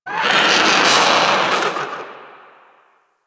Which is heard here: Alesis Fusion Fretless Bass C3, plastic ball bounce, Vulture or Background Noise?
Vulture